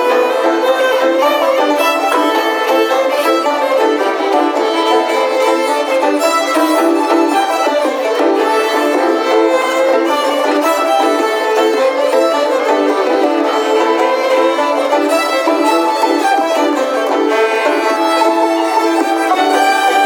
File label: Monks Jig